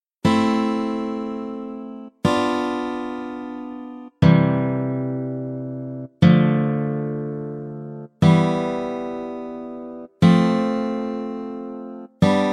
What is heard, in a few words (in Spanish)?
triadas la menor